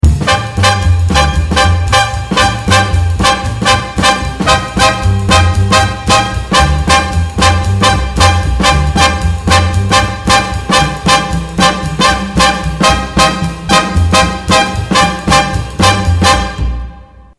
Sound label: trumpets, jazz